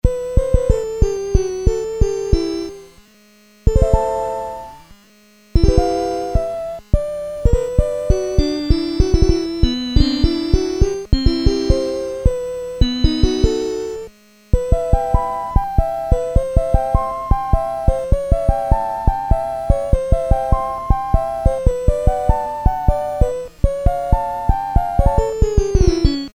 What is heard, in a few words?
toy piano, Casio SK-1; Harmonic component sound